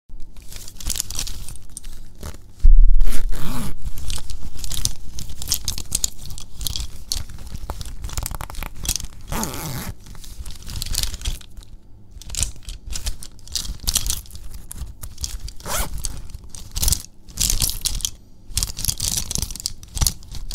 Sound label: Pencil case